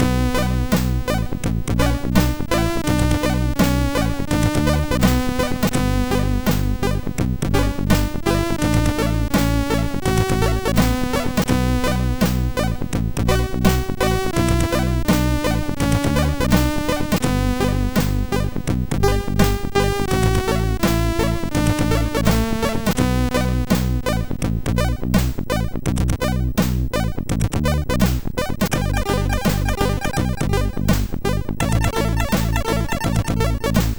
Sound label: Consummation, sid MOS6581R4